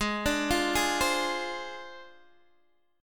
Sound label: G sharp 6th Flat 5th, Guitar, DADGAD; down; chord shape